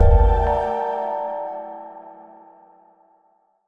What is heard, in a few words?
wistful bass ephemera two hit